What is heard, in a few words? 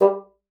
bassoon, G3; Xsample VOL-6